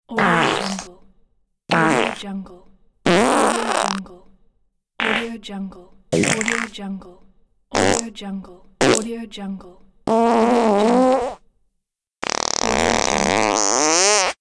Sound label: farts